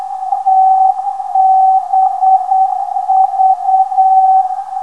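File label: TBV 490kHz, non-directional beacon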